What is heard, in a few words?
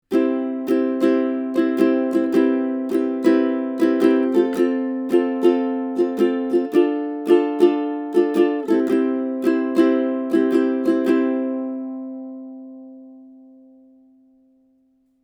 Schoeps MK4 Ukulele